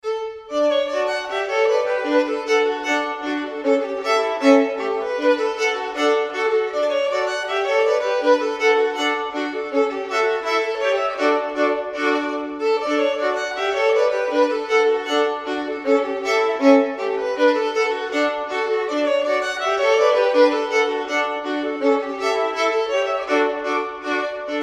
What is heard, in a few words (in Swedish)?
schottis